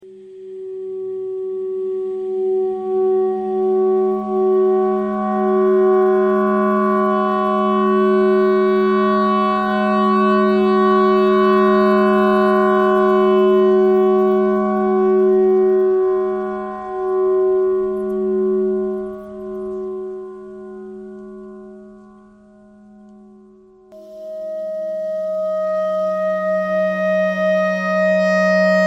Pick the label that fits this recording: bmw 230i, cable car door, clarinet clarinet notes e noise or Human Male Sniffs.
clarinet clarinet notes e noise